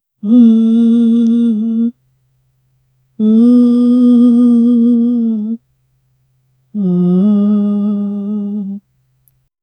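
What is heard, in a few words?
human male voice soft melodic, singing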